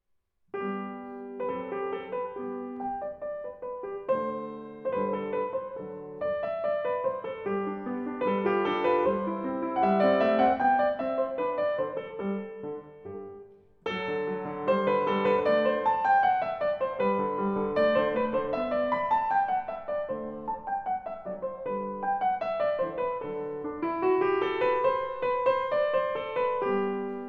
Piano Sonatina in G Major, Anh. 5 No. 1 I. Moderato; Maurizio Zaccaria